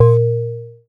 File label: BELL-GONG, A#; Big Fish Audio